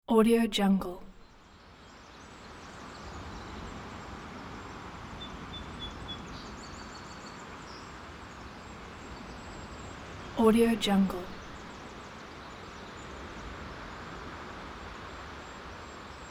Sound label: birds on shore; alexleadsounds